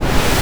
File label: riser fast